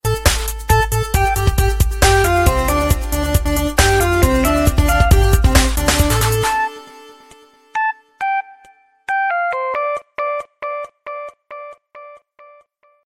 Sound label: tune sms ringtone; samsung